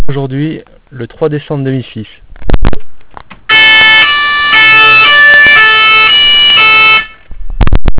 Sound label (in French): Enregistrement Police